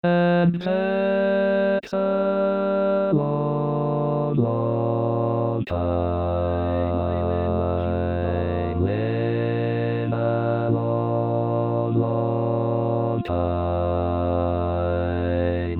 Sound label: lower Bass, barbershop tags